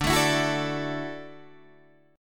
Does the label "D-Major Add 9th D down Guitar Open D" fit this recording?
Yes